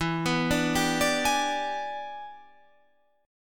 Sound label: E 7th Sharp 9th, chord